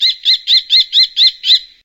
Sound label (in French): faucon crecerelle